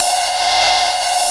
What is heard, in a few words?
SPLASH, DRUMS; Analogon